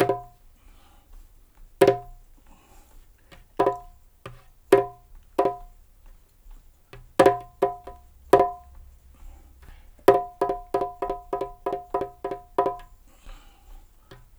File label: 135 BPM, perc loops, dub; Drumdrops In Dub VOL-1; 130-140 BPM